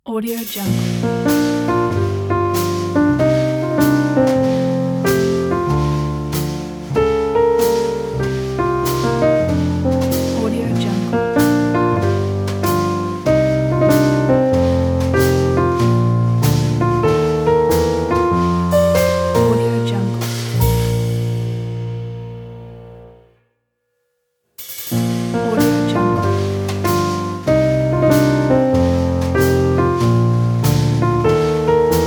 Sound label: lounge jazz intro; korolkov